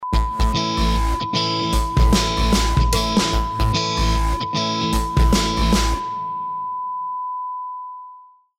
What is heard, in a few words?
Jingle